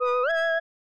fishing caught fish

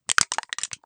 can crush

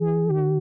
whirlpool bump

